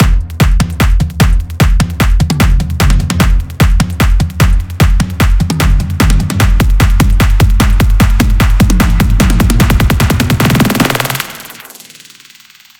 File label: build up cinematic 150 8 bars; paragon